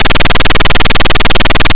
Warning

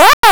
Hit; Galaga

